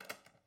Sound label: lock case; angband